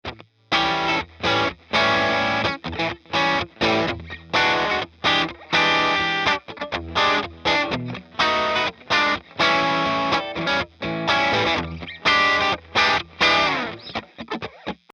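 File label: overdrive Guitar on Axis; Guitar Miking Examples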